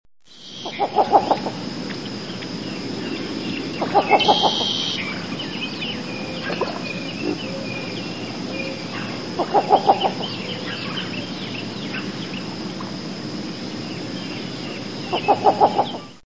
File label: Least Bittern, songs